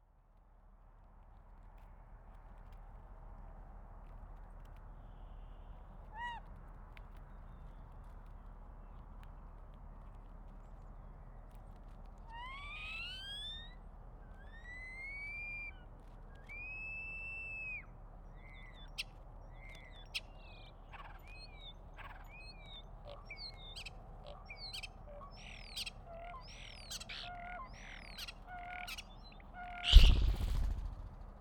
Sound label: starling; The great imitator